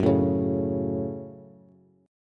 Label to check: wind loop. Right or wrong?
Wrong